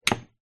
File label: herb spice jar small down next to others on table